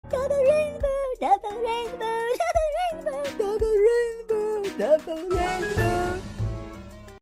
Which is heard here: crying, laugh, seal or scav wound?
crying